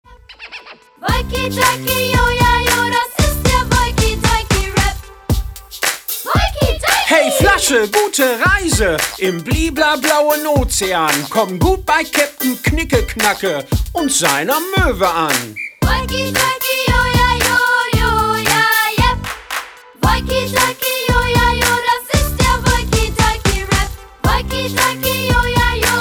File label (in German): Flaschenrap, kinderleider